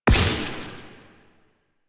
Corruption Pickup Small; Warframe; Zariman

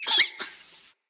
Floppet Player Vocal Gross, Animals

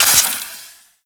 Quill Dart Gun Poison Mech

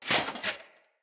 Foley Sword Taunt; Raider; Enemy; Sinecure; Banneret

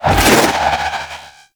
Melee Spin; Warframe; Sentients; Sentient Trooper

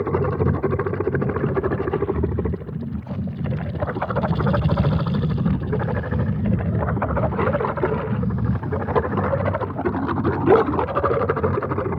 Rotpod Loop; Warframe; NineteenNinetyNine; Stage10TheZoo

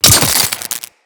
Head Shot Metal, Bullet Impacts; Weapons

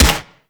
Infested Swarm Rifle 2D Shot; Shock Swarm Rifle; Weapons

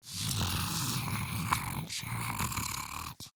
Breathing Grineer Ghoul; Grineer Ghoul Expired; Battle Barks

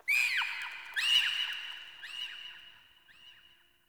Ext Texture Bird Small; Warframe; Infested Eris

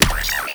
Drone Death; Warframe; Weapons; Archwing; Archwing Healer

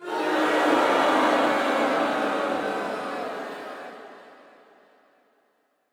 Boo Crowd; Lunaro

